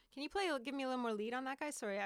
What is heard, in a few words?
LD Voc Double